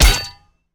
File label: basic hit; smite; osiris